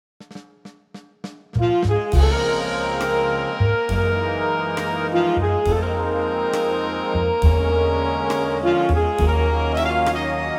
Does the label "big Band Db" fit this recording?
Yes